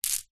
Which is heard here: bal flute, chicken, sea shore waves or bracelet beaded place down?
bracelet beaded place down